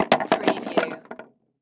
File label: Wood Chair Rock Settle; Sound Effects Bible